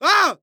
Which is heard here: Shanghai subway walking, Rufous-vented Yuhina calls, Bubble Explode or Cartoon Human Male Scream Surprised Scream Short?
Cartoon Human Male Scream Surprised Scream Short